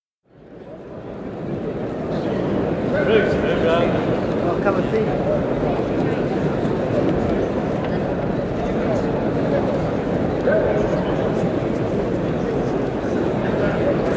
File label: Crowd assembling, Royal Albert Hall; Schools (Sound Effects:Backgrounds); BBC